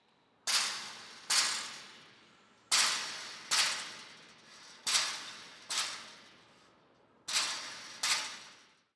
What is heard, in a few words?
Banging On Steel Window Wire